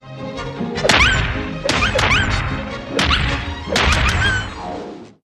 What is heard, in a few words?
Dog Beating Funny